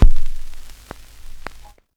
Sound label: PUNCH REC